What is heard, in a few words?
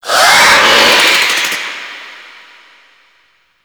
Infested Scare Vocal; Gameplay; Warframe; Corpus Infested